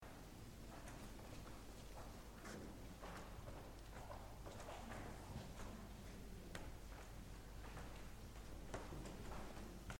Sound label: footsteps and creaky floor at a matise exhibition albertina vienna austria